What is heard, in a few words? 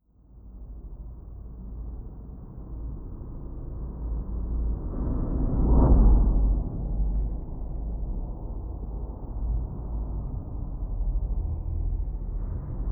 TRANSPORTR, Anime Sound FX, FLYOBJECTS; AKAI Anime Sound FX